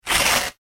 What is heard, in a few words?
card rip; Card Or Thick Paper Ripping / Tearing; Objects; McKinney Sound